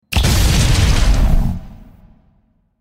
civillian explode

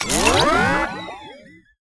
pod appear; lbp

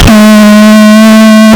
jumpscare

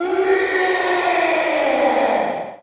roar; frankenstein

